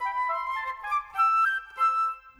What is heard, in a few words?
Winds, Orchestral; Sonic Foundry (Sony Creative Software); Orchestral VOL-2